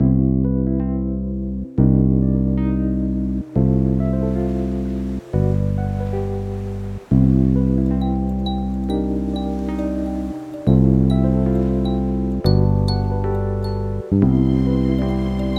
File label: Sunny Interlude without Drums